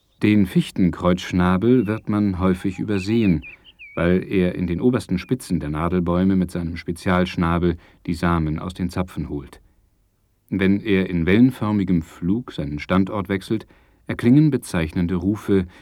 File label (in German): fichtenkreuzschnabel, gesang; singvoegel; kosmos 93